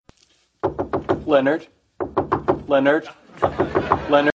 knocking; ringtones

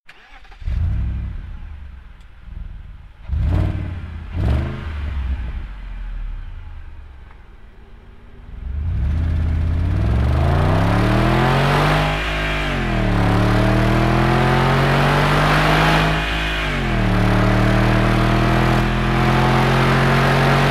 BMW engine sound; ringtones

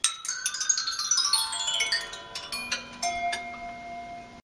Turbine Bells

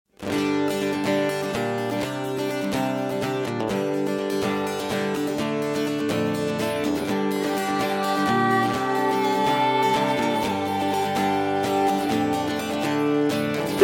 nice rock guitar; ringtones

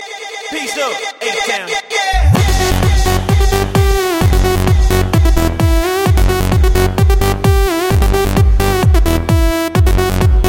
yeah electro new, ringtones

